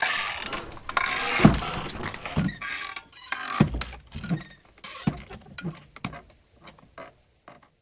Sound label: pub door; DeadFright